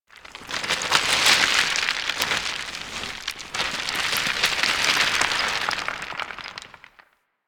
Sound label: Large Rock Crumble F; Ambience; Warframe; Grineer